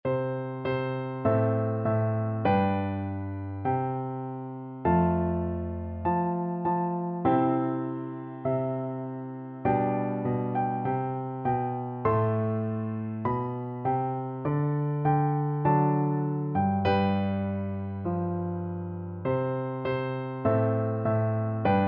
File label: holy holy holy, piano duet